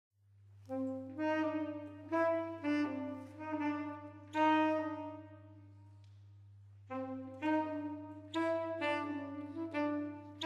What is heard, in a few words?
Sax Blues